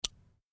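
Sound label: sounds of quadcopter drone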